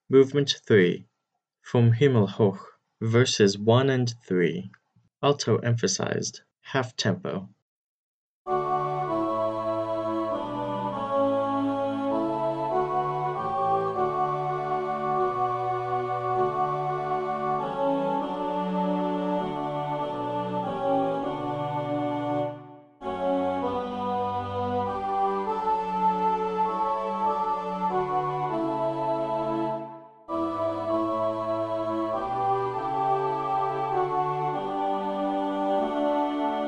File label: alto emphasized half tempo, choir